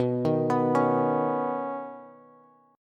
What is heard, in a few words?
B Major Add 9th B Bass; Badd9; arpeggiated; JGuitar